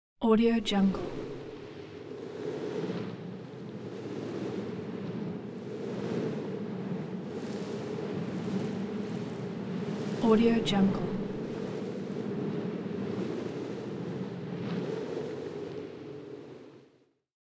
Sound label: rustling wind ambience; hollywoodedge